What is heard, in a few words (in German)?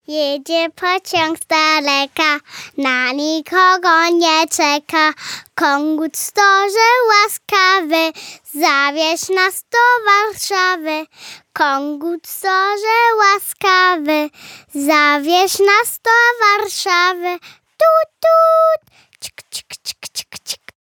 Gesang POL